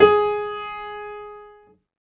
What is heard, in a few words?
Piano, Harmonic